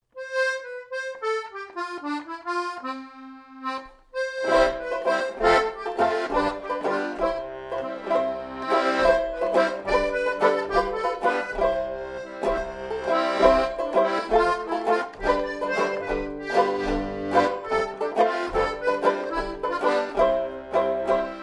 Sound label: Backing Track; Nailmakers Strike